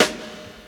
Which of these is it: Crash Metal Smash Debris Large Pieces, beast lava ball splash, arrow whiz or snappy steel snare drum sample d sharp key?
snappy steel snare drum sample d sharp key